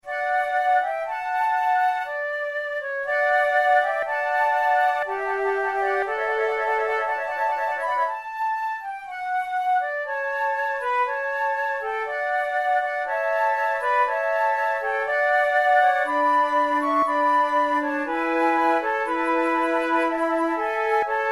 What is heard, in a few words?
sonate en trio, lentement, flute